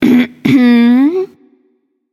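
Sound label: clear throat, female; smite; voicepack; hera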